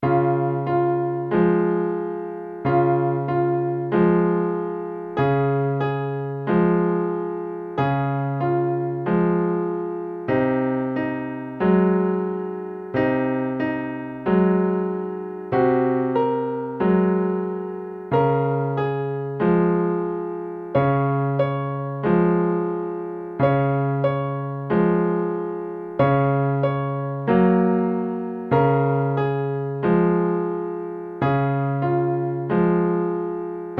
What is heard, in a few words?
jacobs ladder broken chord bass; 2nd style version